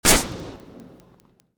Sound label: gun; mcheli